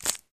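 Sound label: Grapefruit Tear Short; gore stabs & eat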